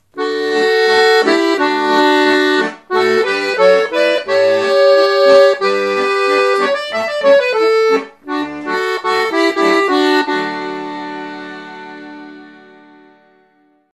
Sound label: spring, accordion; Middle Reeds Sound; The 2 Middle reeds are tuned about 10 cents wet/musette; rivoli 460; 5 sets of bass / reeds